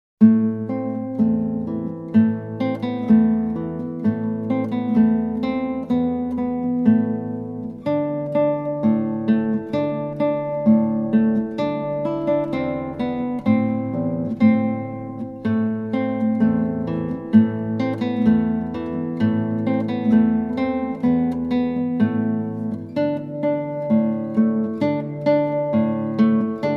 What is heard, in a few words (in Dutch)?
Gitaarboek Russian Dance; Go for Guitar vol.2